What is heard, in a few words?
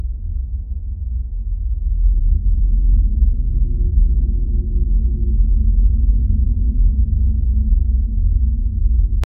loopable dark ambience, liminal